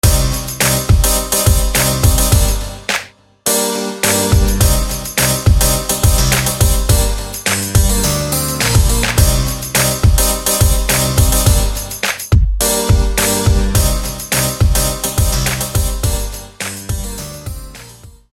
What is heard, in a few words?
Clav Attack